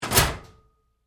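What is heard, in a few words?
Door Locker Close Close Up Light, Metal Door; Doors; Big Room Sound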